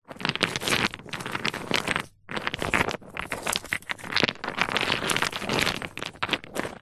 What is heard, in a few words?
foley stones medium sized movements; zapsplat